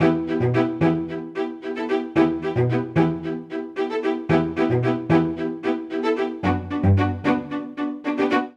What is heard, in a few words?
Ostinato Determined E Minor 112 bpm, String Quartet; MIDI Clips; Ableton; Spitfire Audio